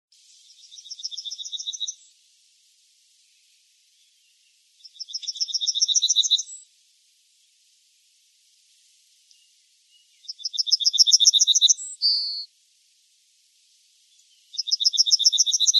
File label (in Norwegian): Gulspurv lyd